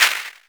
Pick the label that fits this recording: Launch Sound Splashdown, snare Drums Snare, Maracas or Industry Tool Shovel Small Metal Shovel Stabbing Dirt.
Maracas